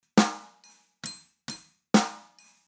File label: wet snare